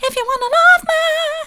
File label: soft, vocal